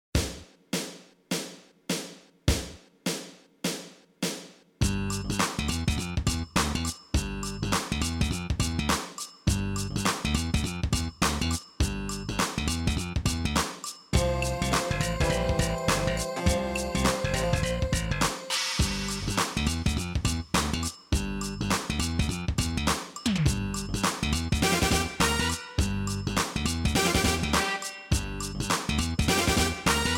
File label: recorder funky feels practice